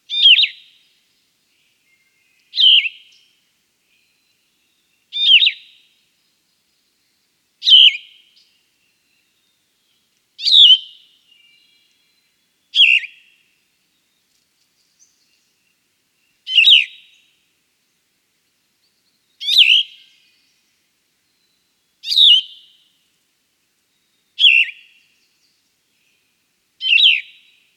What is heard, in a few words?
Yellow throated Vireo; winnipeg